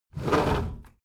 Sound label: window roller shutter close soft single pull